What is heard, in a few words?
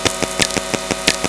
chirp; physics radio